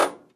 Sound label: Metal Solid Impac Bullet